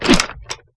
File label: melee swing